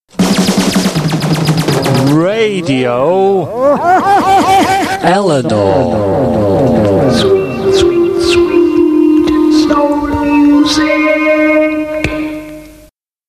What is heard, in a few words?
Jingles